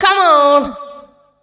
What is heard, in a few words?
PHRASE, Voice Spectral; Best Service; Voice Spectral II